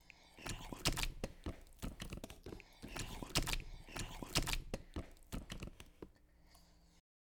Alien Slime

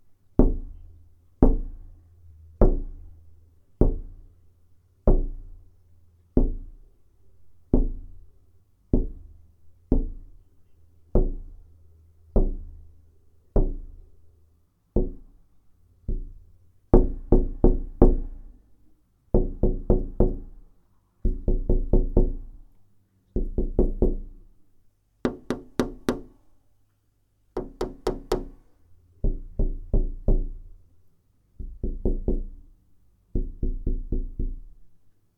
Window Tapping

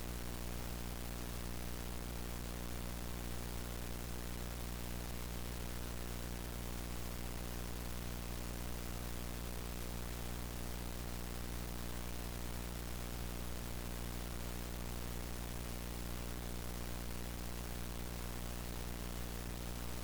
Noise Static Tv Noise

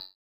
Beep Touch

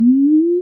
Improvement Healing Chime

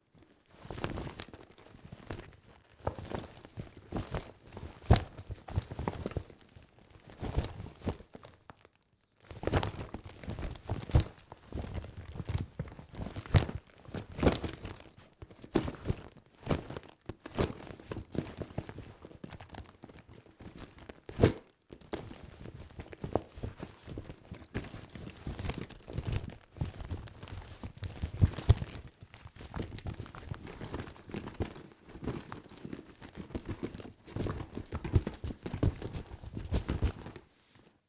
Bag Leather

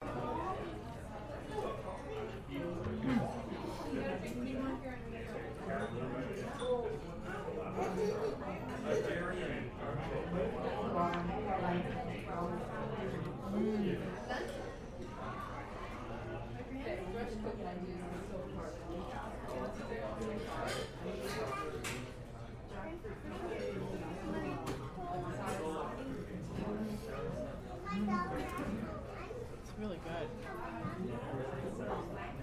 Restaurant Ambience Chatter

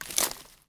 Breaking Wood

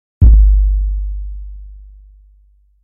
Sick Nasty Bass Hit

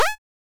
Jump

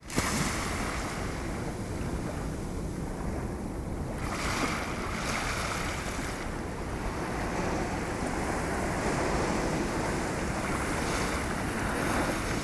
Barceloneta beach

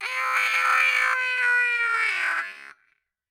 Scream Slow High

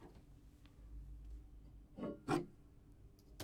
Grabbing Small Knife

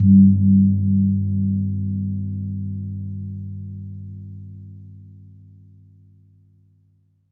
Gong Kempur

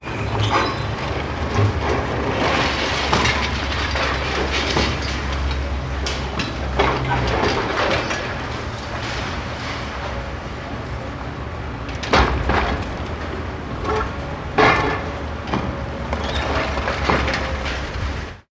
Distant Demolition

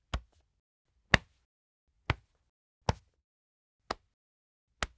Punching

